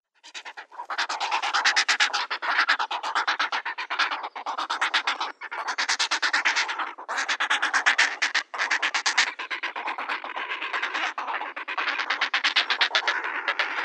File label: Grey Heron nestlings